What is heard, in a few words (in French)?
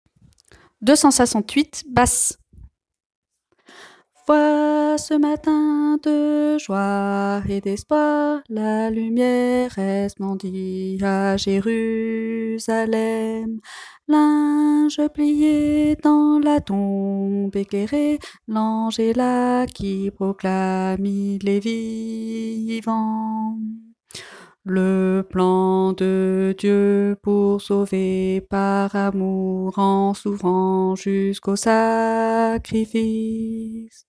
basse